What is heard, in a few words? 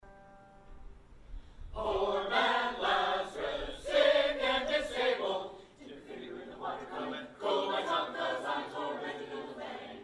Poor Man Lazarus (acapella)